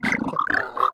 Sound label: Roar; Mud Lung; Mungling